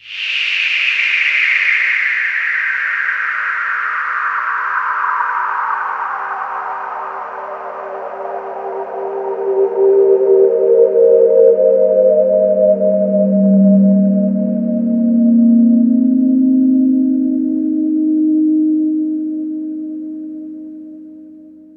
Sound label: Lullaby Eb, Drum & Bass Pads; Total Drum&Bass; 90 s Sample CDs